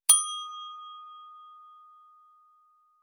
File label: success